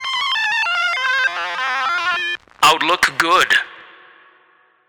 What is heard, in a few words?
8 ball sfx